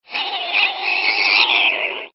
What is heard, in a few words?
pouic; Страшные звуки для монтажа